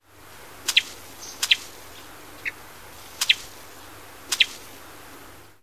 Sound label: call